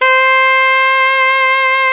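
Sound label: saxophone alto fortissimo; Roland - LA Composer vol. 1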